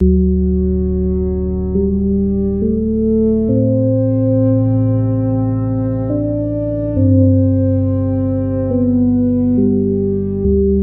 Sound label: KEY Magical Sunset; Phasewaver